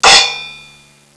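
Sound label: choke cymbals; Sound Effects for Multimedia - Volume 1; percussion instruments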